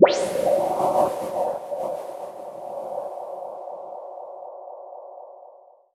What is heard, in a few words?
Noise, Processed Noise